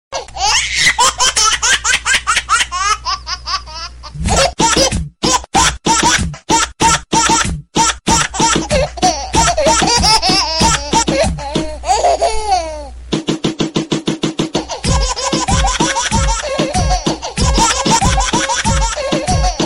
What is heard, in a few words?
Baby Rap